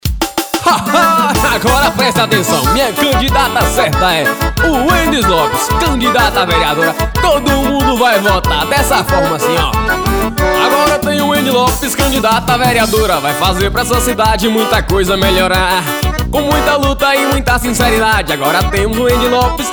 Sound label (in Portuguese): jingle